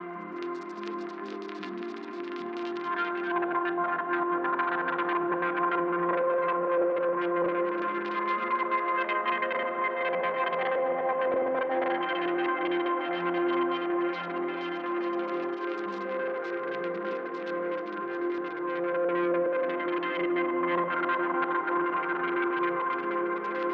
Code Morsel F dorian

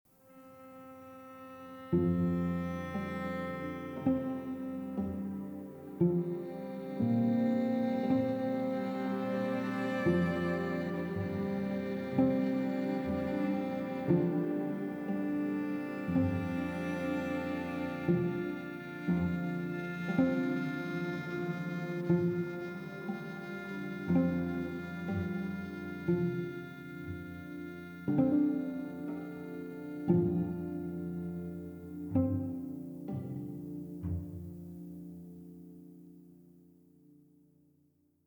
Soft Theme

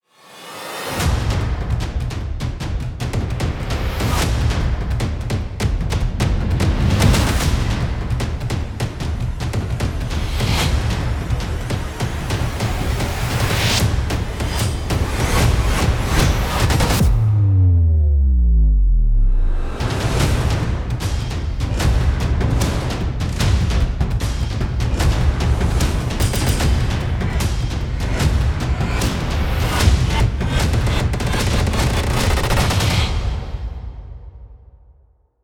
Action Trailer Sketch

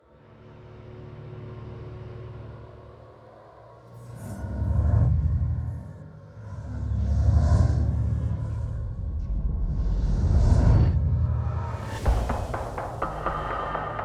Fight Scene

